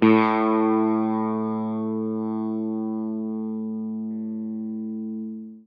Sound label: EL SITAR HRD; Quantum Leap - Guitar & Bass